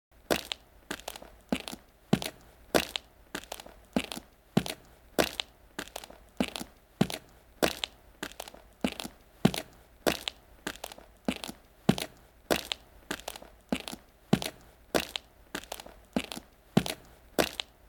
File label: footsteps unisex cement